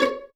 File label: Violins pizzicato C#4; Best Service ProSamples vol.51; Classic Orchestra 2